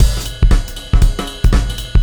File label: Shuffle Beat